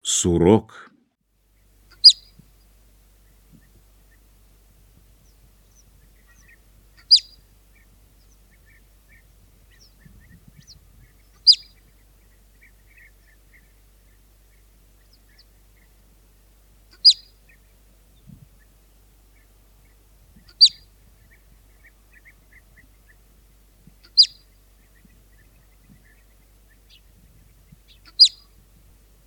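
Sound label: Marmot; Golosa ptic i zverei; Surok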